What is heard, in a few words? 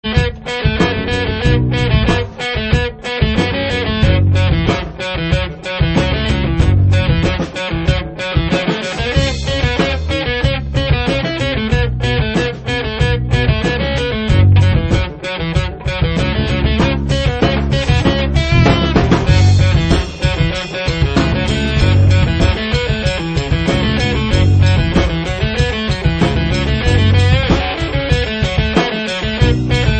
Rock Trio; Kung Fu Master